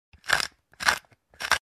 Pepper Shaker

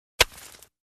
Smashing rock with a pickaxe soft

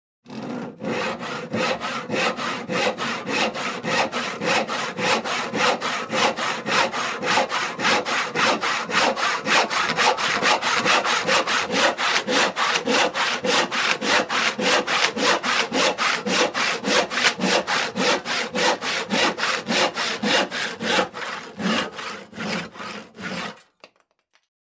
Construction

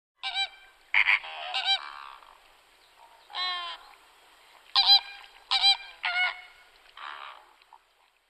Peacock Hooting

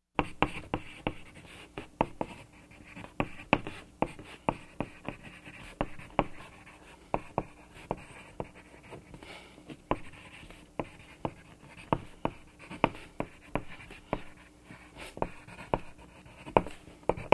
Chalk Writing on Chalkboard

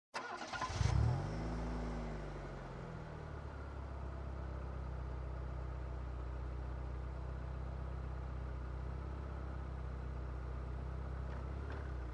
Car tires on dirt road

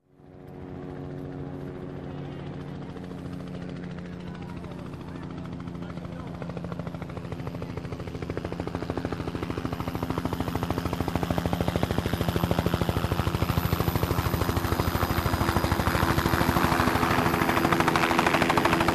Helicopter at air show

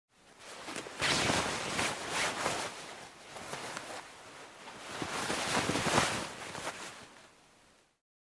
Cloth Rolling

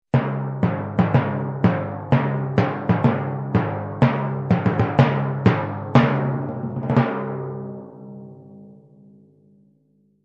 Fat timpani orchestral drum sound

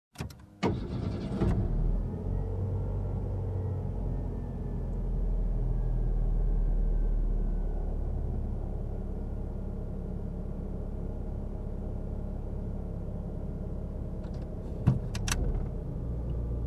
Car engine start short drive

